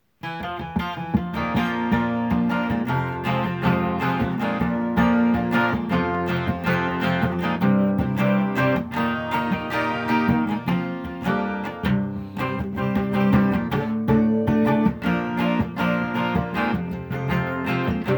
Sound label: acoustic; the bar at friends uncorked